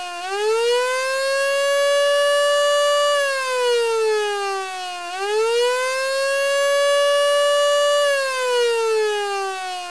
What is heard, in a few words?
Tornado Alarm; Chicago